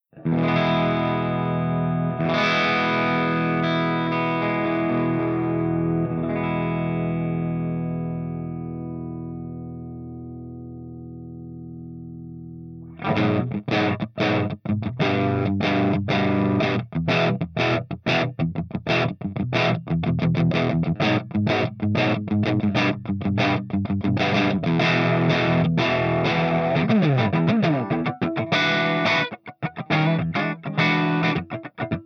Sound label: PLEXI CH2 GB